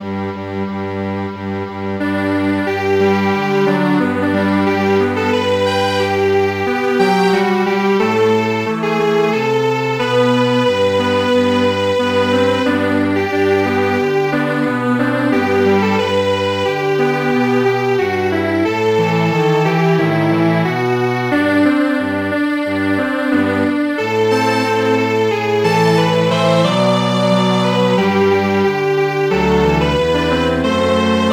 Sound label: Strings Quartet